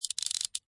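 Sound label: Handcuffs Latching, Light metal ratchet sound of pair of handcuffs; Latch Sound Effects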